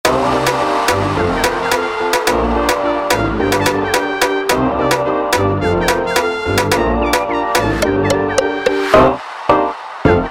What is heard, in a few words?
Rap Funk slowed